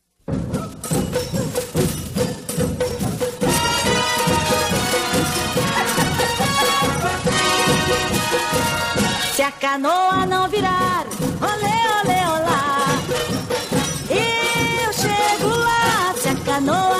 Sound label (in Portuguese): marchinhas de carnaval